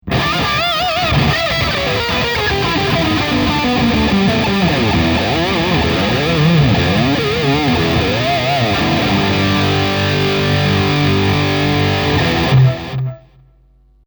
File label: Bridge Lead